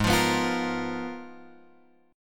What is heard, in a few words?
G sharp Minor 6th Add 9th; G#m6add9 Chord {4 X 3 4 6 6} strummed